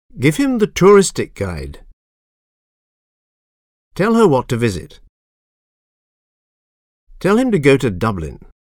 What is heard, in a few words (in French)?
son techno